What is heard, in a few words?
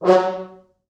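French Horns staccato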